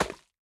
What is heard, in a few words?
Slime Impact Large